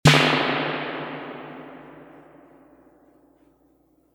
reverb tank 3-spring short drum machine snare

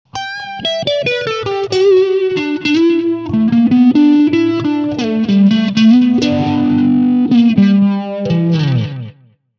solo wet 4-stage

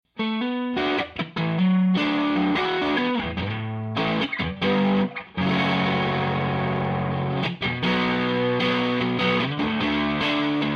overdriven

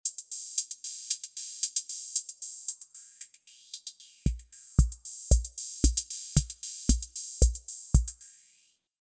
drum machine wet

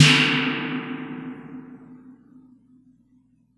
3-spring medium drum machine snare

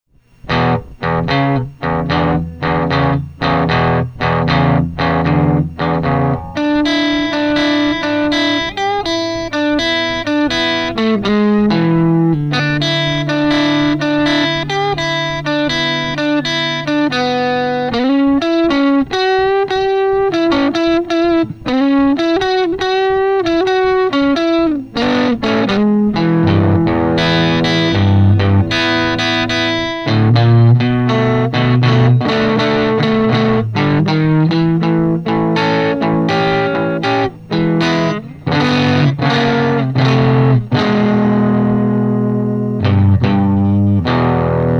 jc10-40fa blues

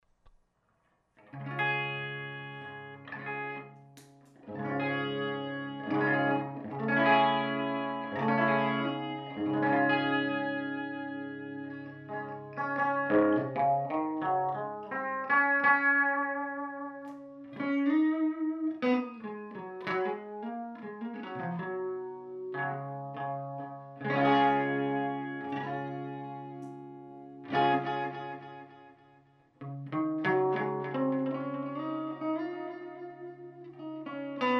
suspended chime

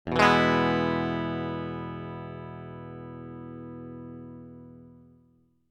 strum dry

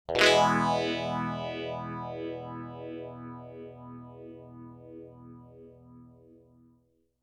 strum 12 oclock with 4 stages

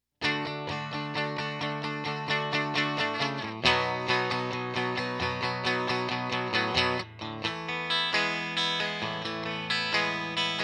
tone attack riff dry

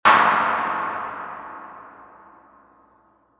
reverb tank 2-spring short drum machine clap

